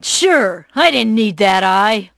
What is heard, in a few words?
Female Eye